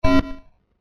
Long And Slow Buzzer Button, electronic sound effect